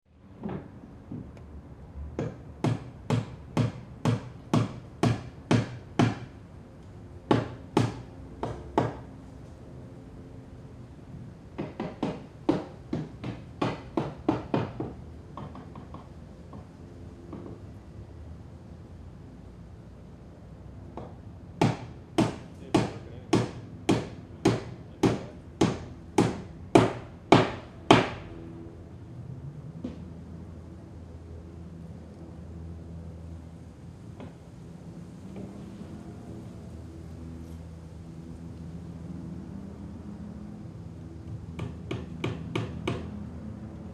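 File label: hammer knocking indoors sounds outside the window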